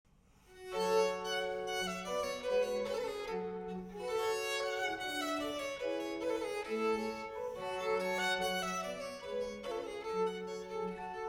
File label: Baroque Violin Sonata No. 6 in C Minor Gavotta